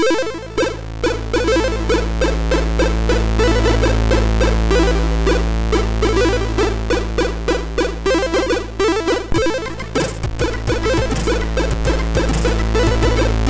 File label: sid MOS6581R3; Legacy of Time